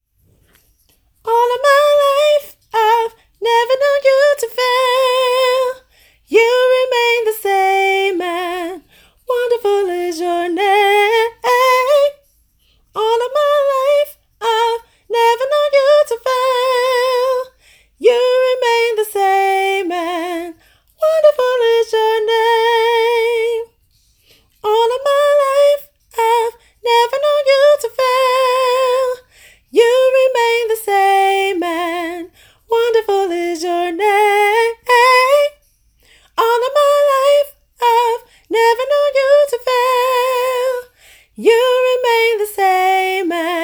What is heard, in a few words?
Soprano